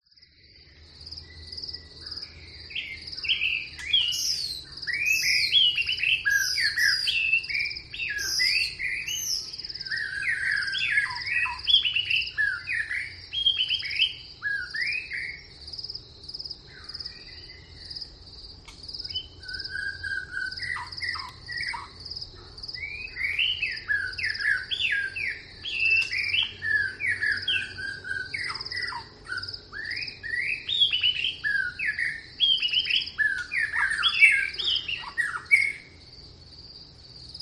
jungle rainforest daytime birds singing close up distant traffic vietnam